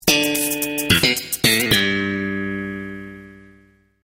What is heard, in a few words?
Sitcom Bass Riff